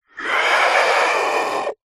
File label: horror monster demon screech